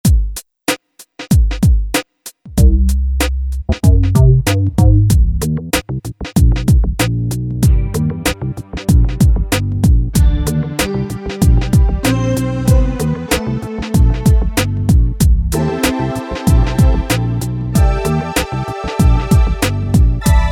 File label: RnB split, Grooves examples; Generalmusic Equinox